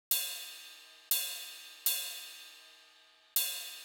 Ride; hydrogen

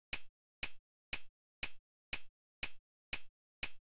Classic 626 Tambourine; hydrogen

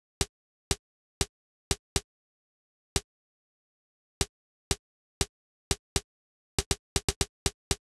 Hat Ring; hydrogen

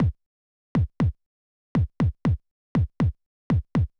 Plastic BD; hydrogen

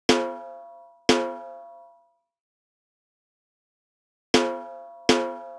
Timbal; lmms; madmans

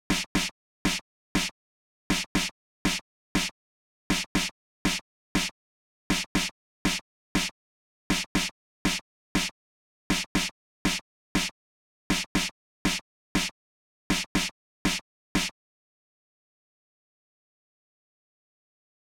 Snare; hydrogen

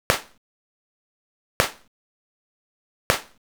clap; Denon; hydrogen

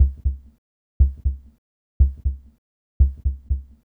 kick Denon (manual); hydrogen

